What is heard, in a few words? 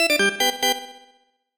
Jingle; Dr Mario